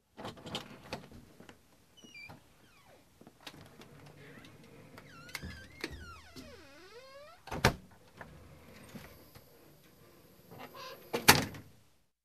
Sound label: Wooden Door open close with creaky sound